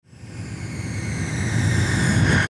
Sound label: sound shutdown, Nokia 6270